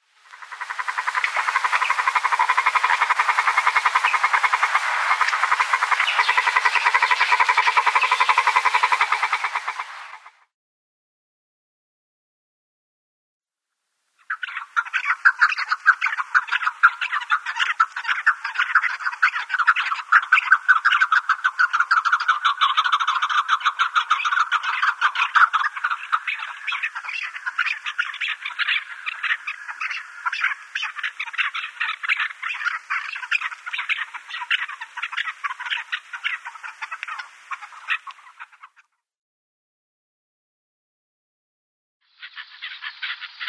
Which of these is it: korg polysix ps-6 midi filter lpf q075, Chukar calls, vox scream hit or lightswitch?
Chukar calls